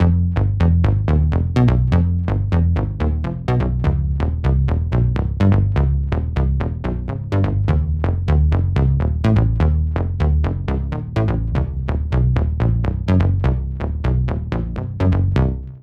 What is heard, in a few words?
Deep Morph Bass 125bpm